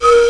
Wood Flute; Fixation

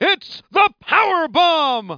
power; WCWDEMO

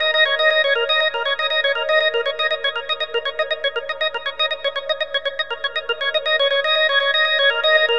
GATEDO, Rythme

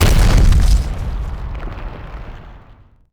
meteor

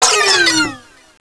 magic

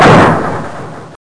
COWBOY BANG

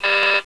BUZZ; QUIZ

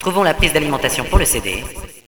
kit; Villagina; ecole

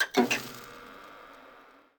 monitor on; letters

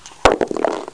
dice end; VEGAS Girls

